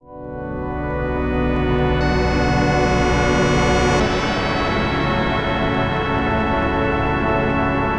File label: Detuner Pad, Synth; Ableton; Factory Packs; The Forge by Hecq